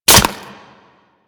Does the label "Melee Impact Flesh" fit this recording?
Yes